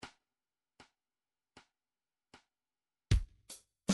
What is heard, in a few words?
drum